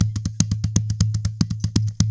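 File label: kalabass groove; Best Service; World Colours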